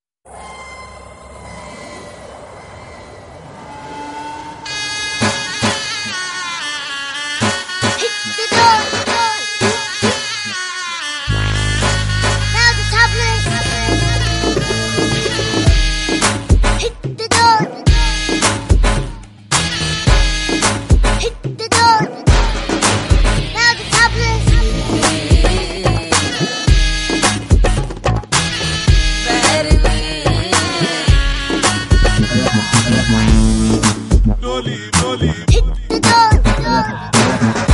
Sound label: Hit The Dhol; UK Punjabi; Rock And Dhol